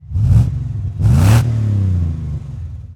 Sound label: Truck Explorer Rev; SFX Kit; Trucks